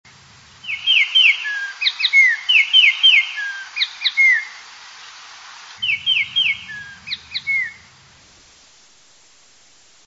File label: Spot breasted Oriole